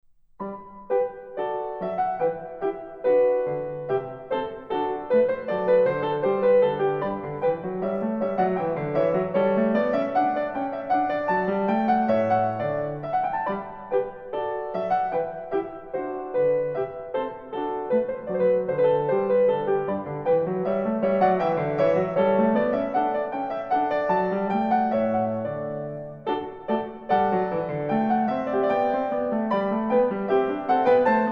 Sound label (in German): franzosiche suite n5 g dur bwv 816 gavotte